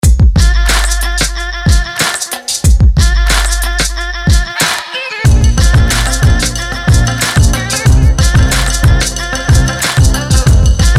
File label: Hip-Pop